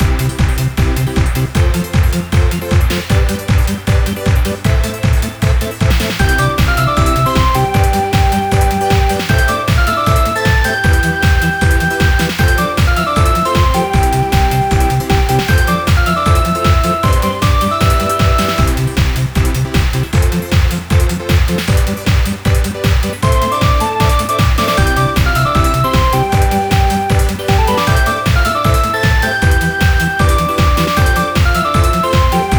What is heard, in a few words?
bpm155, music for racing and action games. bright music.; Blossom Mountain; climax